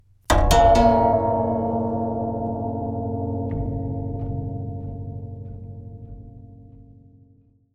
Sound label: music secret door unlock; mans office